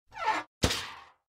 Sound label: vent out